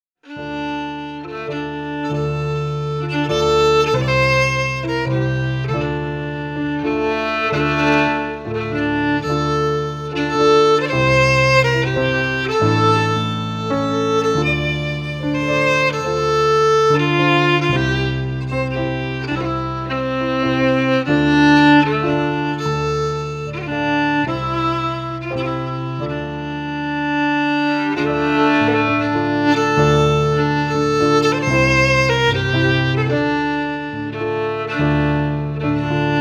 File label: FIDDLE PIANO; Jink & Diddle Book o' Tunes 2022